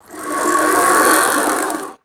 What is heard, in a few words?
metal pulley sliding quickly on track